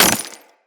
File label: Melee Impact Glass